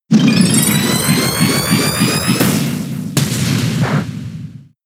Techno Blast; Pokemon; gen 6 attack moves; XY ORAS

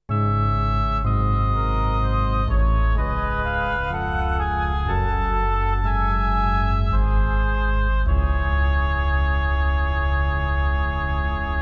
Sad Wind; rise of the phoenix

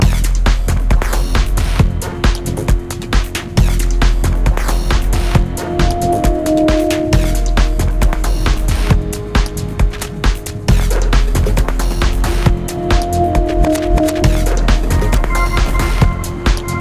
Music; Puddle Plus

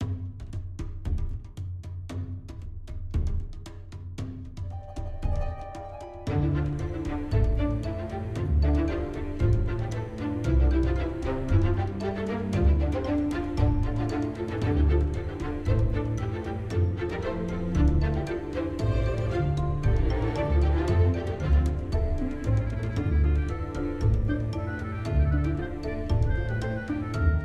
Shop In door; Anak Rote

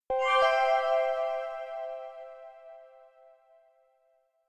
Fountainrecover Cue C; super mombo quest

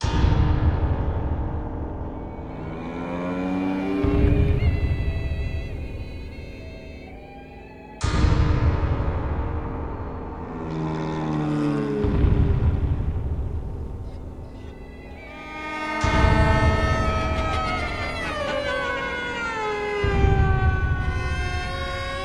Mus Spiderboss Loop Soft; Chapter3 Glacier

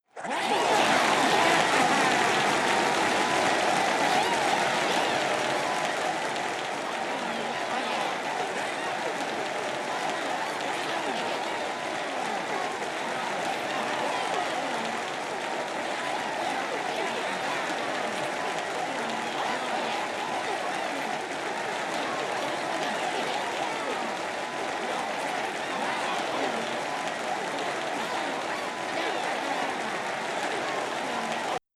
Audience Cheer; gamerip; Paper Mario The Thousand-Year Door

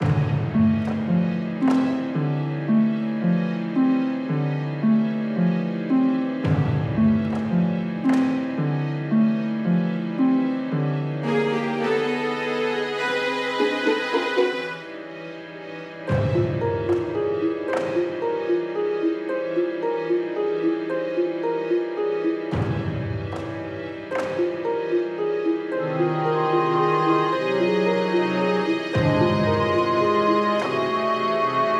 Bgm Fantasy; RPG Maker Fes; 3DS

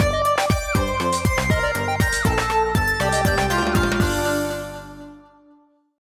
Career Lose; blokus; ps3

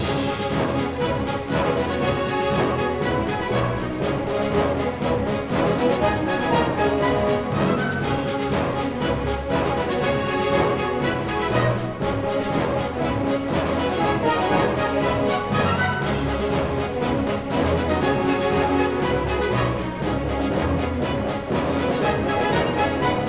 Music Fight Theme Hard 120bpm Loop; asterix obelix slap them all 2; Romans

